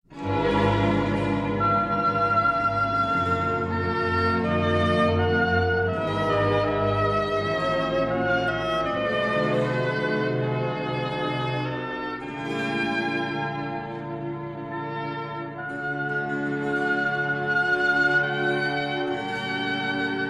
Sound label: Concerto for Oboe, Strings & Continuo in D Minor, Adagio; TWV 51d1; III. Adagio